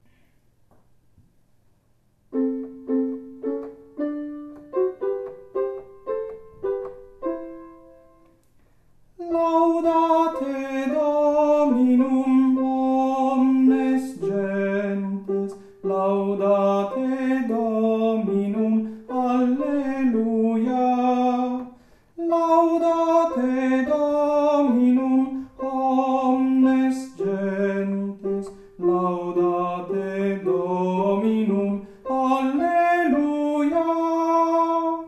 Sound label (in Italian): Laudate Dominum tenori; Laudate Dominum 2011